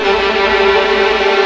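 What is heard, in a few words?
MAJOR TRILL G3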